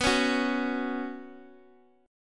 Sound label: B Suspended 2nd